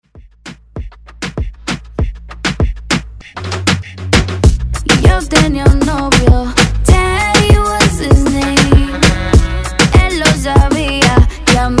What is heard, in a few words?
Reggaeton; Redrum